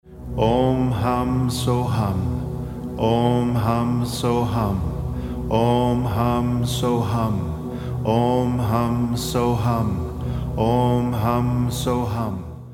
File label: Om Hum So Hum, Mantras